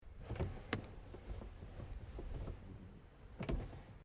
CAR HOLDEN HD PREMIER WINDOW MANUAL OPEN, Classic Australian cars